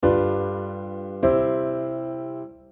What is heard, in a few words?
tritone resolution dominant chord